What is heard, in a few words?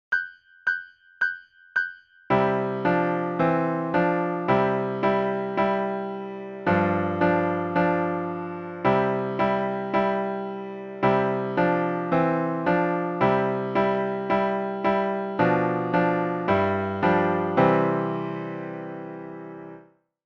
Duet